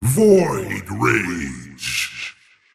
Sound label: attack pain; razor